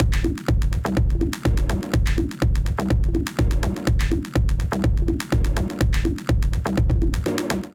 Alarma Kit 124 bpm; Ableton; Punch and Tilt

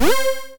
Snarky Stab, Synth Lead; Ableton; Factory Packs; Synth Essentials; Analog

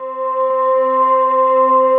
Vocal Ambience, Ambient & Evolving

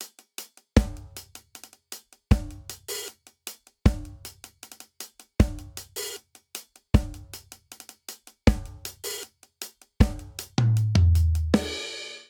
Straight Reggae Verse 78 bpm; 4 4; MIDI Clips; Ableton; Factory Packs; Drum Booth

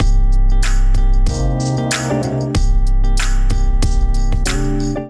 Kit groove 94 bpm; MIDI Clips; Gotta Feel Kit groove; Ableton; Factory Packs; Beat Tools

